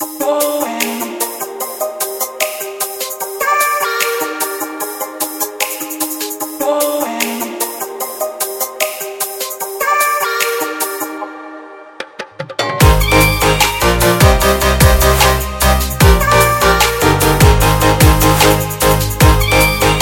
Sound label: Kit Full Loop